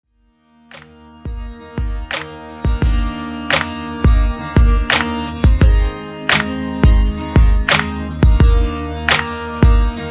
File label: Extended 86 Bpm